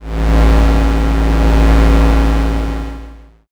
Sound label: space engine